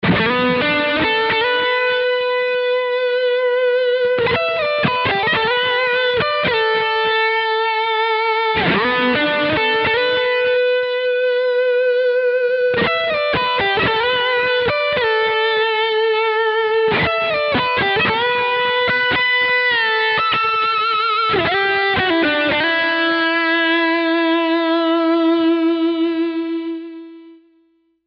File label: GT-10